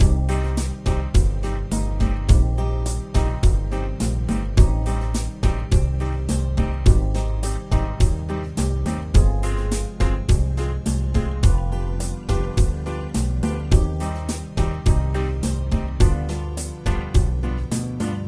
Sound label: Sad Town, Music Loops; Kenney's Sound Pack